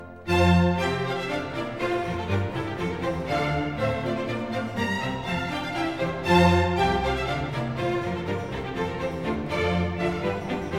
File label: Water Music Suite No.1 in F major - Hornpipe; Handel Masterworks; Klassika